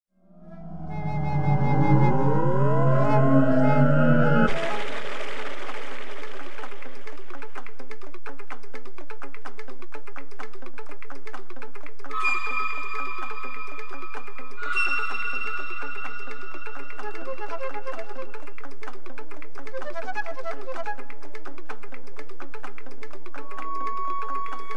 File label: arrow